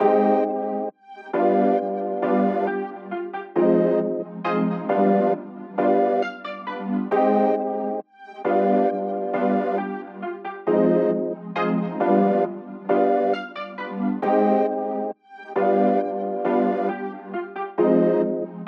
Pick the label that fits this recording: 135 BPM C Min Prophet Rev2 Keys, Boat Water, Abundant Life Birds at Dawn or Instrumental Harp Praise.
135 BPM C Min Prophet Rev2 Keys